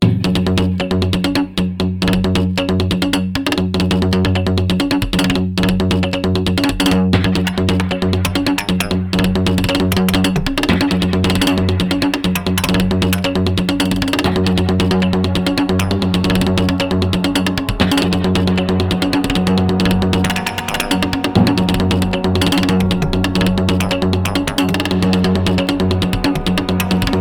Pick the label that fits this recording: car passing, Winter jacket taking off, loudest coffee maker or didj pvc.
didj pvc